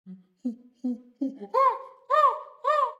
Monkey Win; Pitch Black Audio Pong

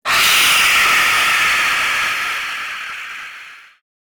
Air Scream - Short Pass By Zombie - Long; Hollywood Edge; sound designer's toolkit v2

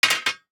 DICE on Metal, Drop, Standard, 5 Five Dice; Samples; ArticulatedSounds; DICE Sfx Party (Extended)

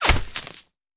Bullet Impact; Sound Ideas; Guns

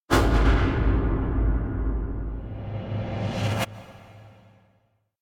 Impact Metallic Tension - Sharp End; Hollywood Edge; sound designer's toolkit v2

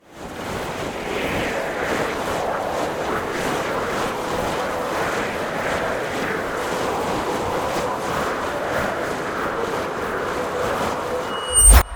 Ice Spell Freezing Slowing Ice Tornado; Arctic Aura

